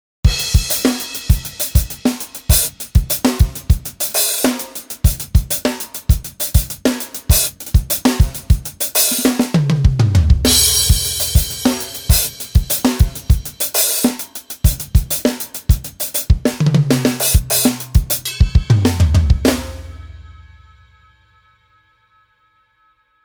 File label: drum sample